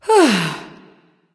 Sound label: nv sigh; smite; awilix